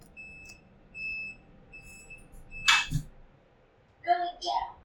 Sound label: Lift Close; Running Late Game